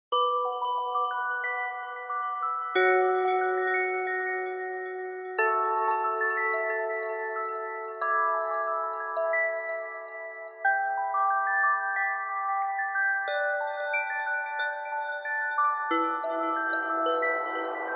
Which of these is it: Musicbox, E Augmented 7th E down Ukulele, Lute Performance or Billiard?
Musicbox